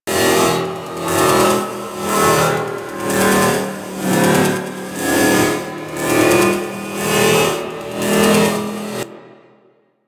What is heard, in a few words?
Research Facil Wall Socket Spinning Loop; Venus; Objective Rooms